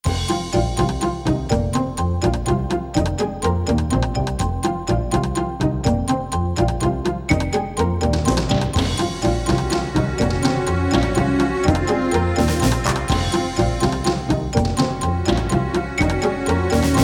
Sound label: Dungeon; Tenshi no Uta 2 Datenshi no Sentaku